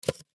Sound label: Styrofoam Crush, Styrofoam Crush Cup Coffee Lid Pop Off; Foley; Crunches; Blastwave